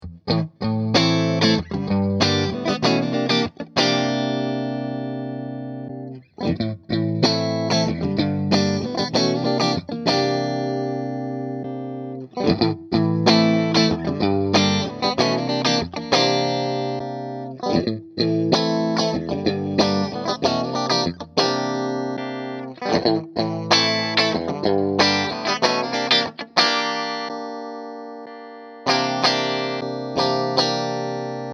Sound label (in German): Strat Moduliert